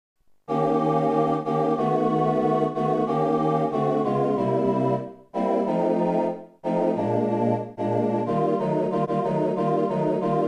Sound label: male backup